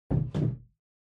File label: Pendulum Clock Hardware Far Hook Hang